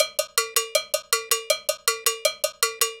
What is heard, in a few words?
Cow Bell, Latin Percussion